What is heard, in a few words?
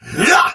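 Exertion Soldier Sword Agent Male, Battle; Dialog; Barks; Melee; Sinecure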